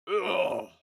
human vocal male shout hit weak

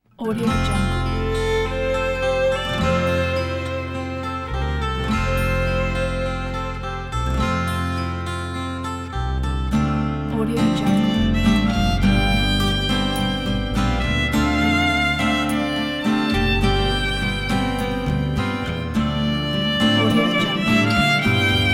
country fiddle theme; griptionmusic

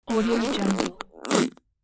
whoopee cushion

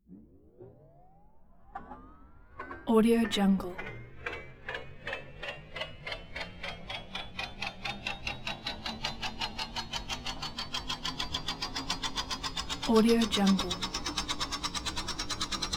transporter

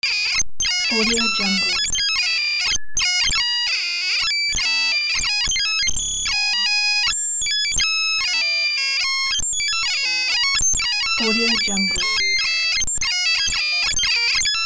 digital glitch

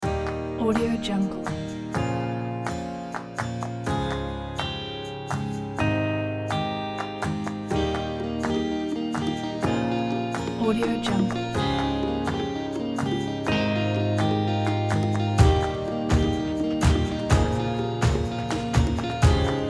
motivating travel pack

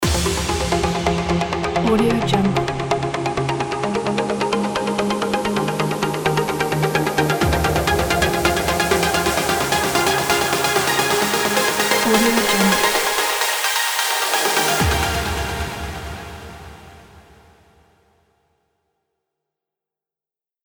uplifting trance ident

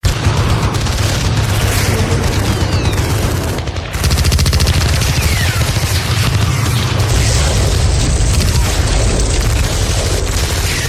war battle ambience

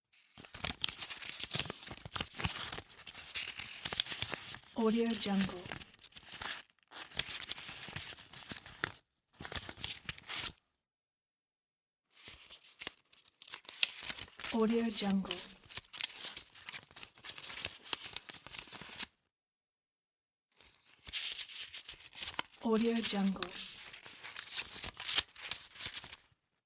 searching through sticky notes

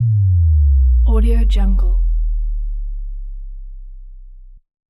sub bass drop

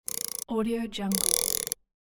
wind up toy crank

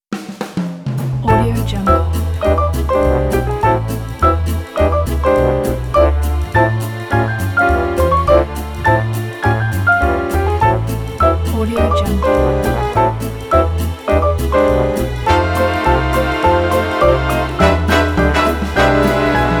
big band; mezzosound

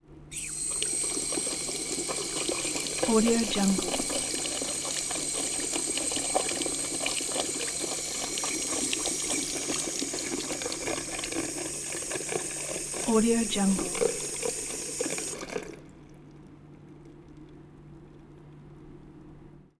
sink gurgle

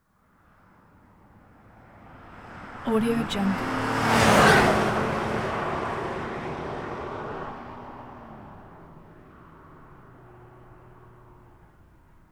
1997 dodge intrepid; prosoundfx

